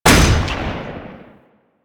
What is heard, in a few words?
Rifle; Warframe; Weapons; Bolto